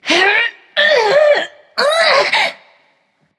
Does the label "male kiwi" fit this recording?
No